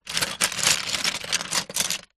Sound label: toys lego pieces box pile movement